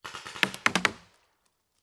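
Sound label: Paintball Gun Impact; Sound Ideas; Guns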